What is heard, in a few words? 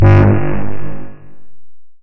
announce bass